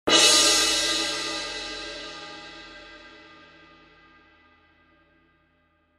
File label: K Constantinople Suspended, cymbal